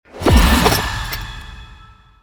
rage shadow; phantom blade

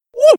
kill; freak fortress 2; some clean trash

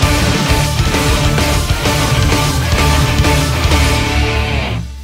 outtro music; ff2; javelin

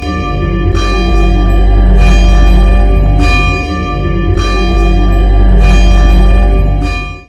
bell; heatcliff

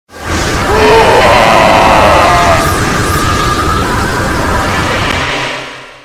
lose

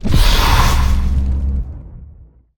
devourer attack; calamity

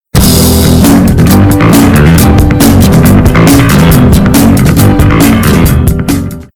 outtro music; ff2

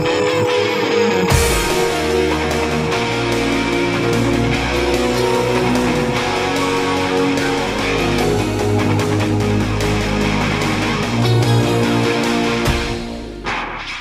intro music; freak fortress 2

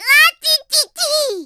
stab; freak fortress 2; kirby

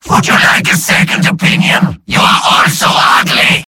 win; freak fortress 2

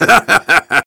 superjump; pu shitcake

